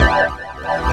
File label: Chords Tones; Equipped Music; Loungin House